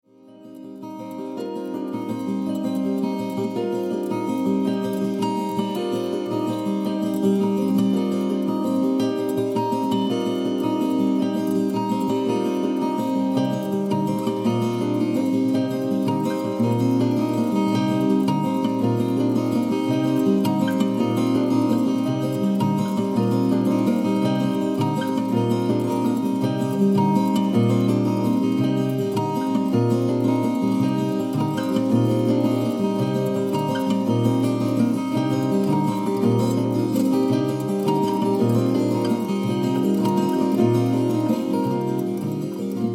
guitar

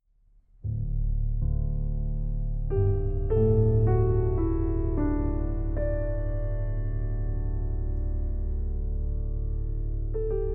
piano

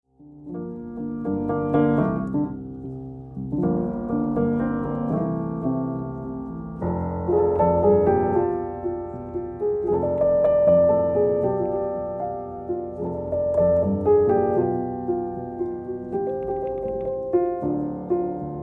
piano

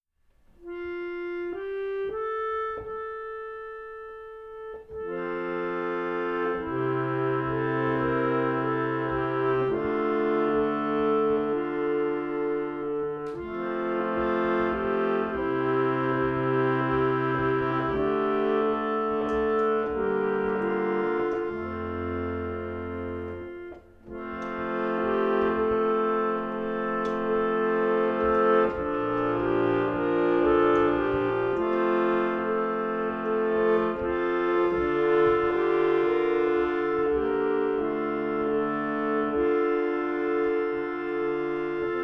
reed organ hymns

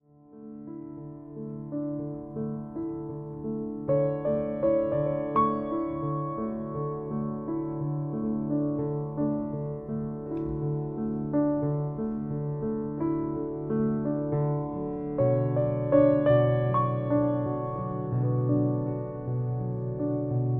silent rain

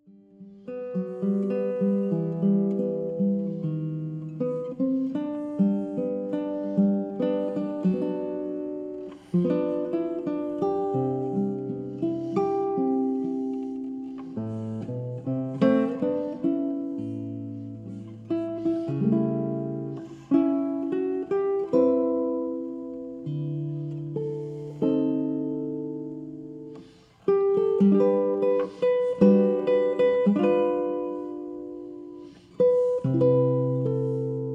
violao violao

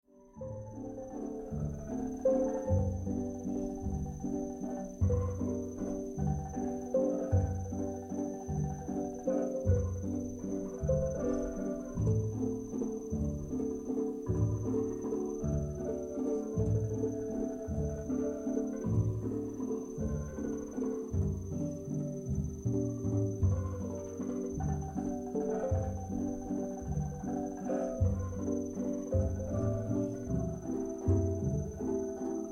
steel band clash

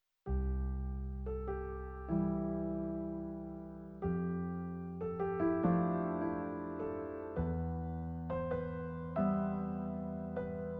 piano

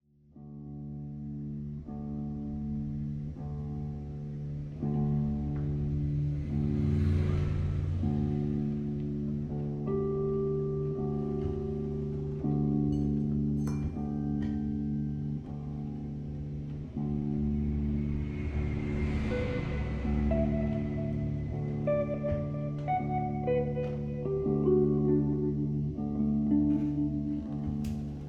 meditation

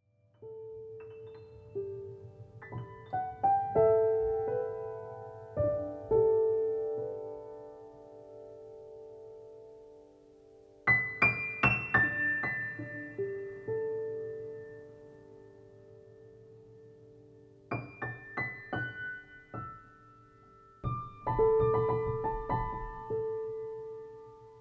silent rain

